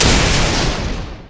thunder claws; LoL; volibear

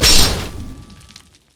power ball; robo rammus

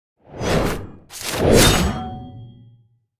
recall lead in; riven skin05

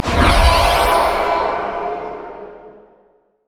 passive dead souls; LoL; thresh

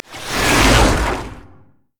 bramble zone splash; Zyra

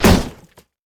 wolfman vs wood; LoL; warwick

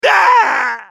dying; XenZhao; Character Voices - English; en US

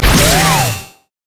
crit attack; rumble skin03

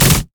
decoy swipe; LoL; monkeyking

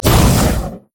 mana flare

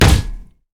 basicattack; pantheon

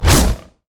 takedown attack; nidalee base

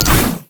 hamstring; LoL; darius zaunknight

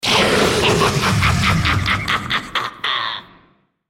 Dragon laugh; Shyvana

